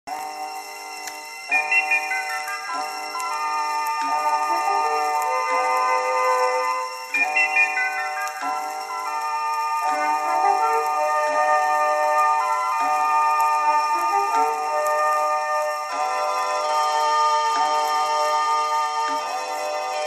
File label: Scotland Music